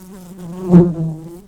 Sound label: Lapidarius workers, bumblebees